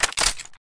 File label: p90 cock; weapons